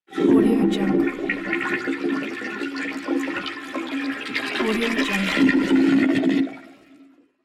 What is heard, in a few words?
toilet flush